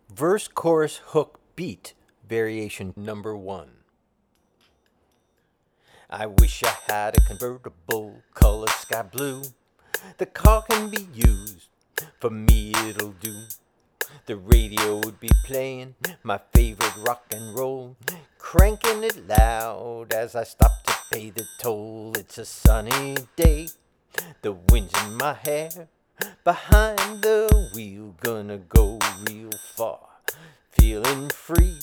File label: Verse Chorus Hook Beat Variation